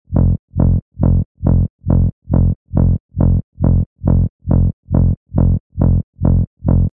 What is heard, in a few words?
Reverse Bass Loop F (138 BPM)